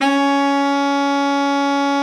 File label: BARITONE SAX; Best Service; Killer Horns